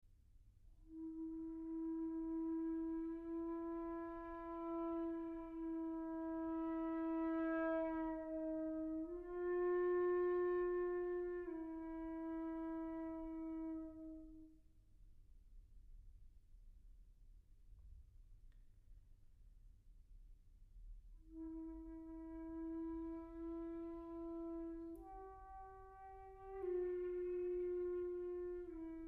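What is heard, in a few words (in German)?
Musik in vier Sätzen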